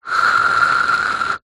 office stationery felt tip pen lid air blow whistle